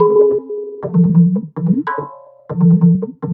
TABLAS; Zero-G; Chemical Beats

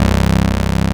BASS R, Drum & Bass 160 BPM; Ueberschall; Drum & Bass Flash Trackz

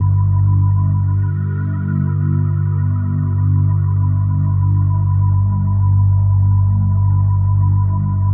115 G Drone Soft Glow; Zero-G - Future Wave

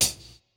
Kit, breakcore Drum Hits

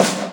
Snare, Yamaha RX5 drums

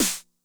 SNARE DRUM Med Tune Med Tone, 909; classic machines; 909mod

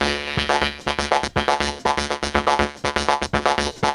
Vocoder; Electronic Point Blank

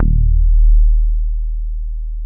DEEEP D#1, BASSES; Best Service Alpha Dance vol.2

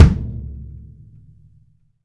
Bass Drum ff, Drum Machines

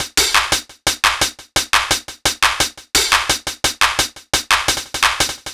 Hi-Hat Clap Combo, Hi-Hat Loops

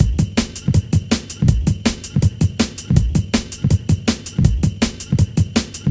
162, breaks, Loops; M8

